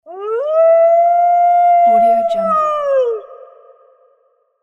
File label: wolf howl; audio-therapy